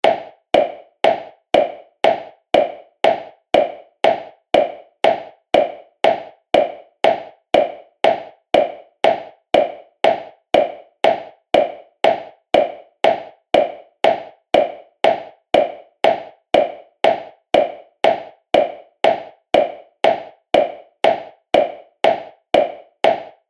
足音 コンクリート 歩く; モノラル

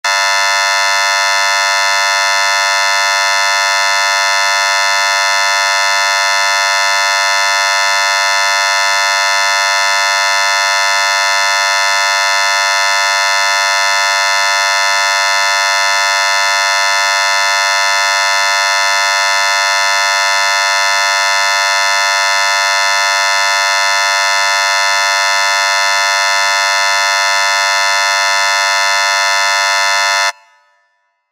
劇場やホールの開演ブザー 30秒 リバーブ有り; 戏剧音效

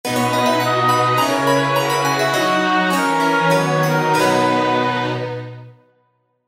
優雅な アイキャッチ; 商用利用可能な無料のアイキャッチの楽曲です

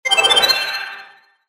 回復魔法 中 モノラル; 魔法効果音

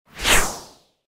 矢・飛ぶ音

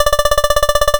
会話; nes retro talk sound effects

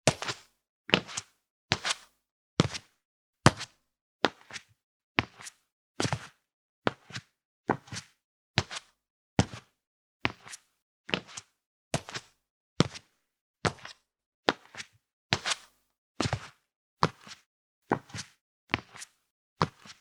足音 スリッパで歩く

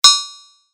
鍛冶 叩く モノラル

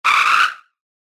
急ブレーキ

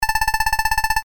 会話

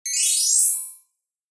キラリン 金属 長

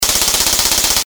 会話音 メッセージ タイプライター モノラル

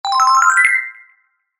決定; モノラル

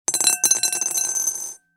チンチロ サイコロを振る; 場面切り替えや心理描写; 演出効果音

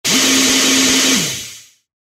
機械の動く音 中; モノラル

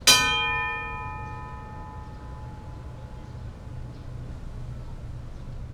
西洋の鐘の音

